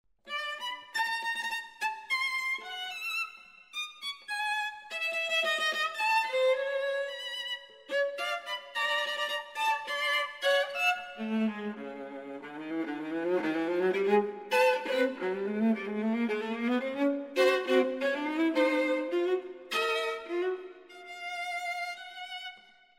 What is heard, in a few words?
Capriccio Viola; Bagatellen